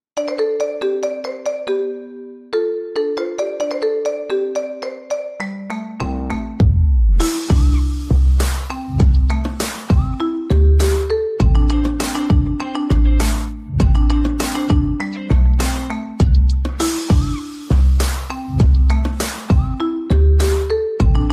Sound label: Marimba Remix